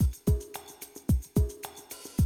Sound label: 110, Classic Drum Loops